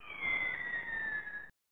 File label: Fireworks Whistle; NCAA Final Four Basketball; SNES